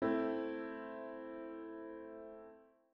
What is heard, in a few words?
A min triad